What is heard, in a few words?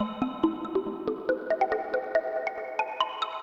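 synth electro 140 A; Techno; Keys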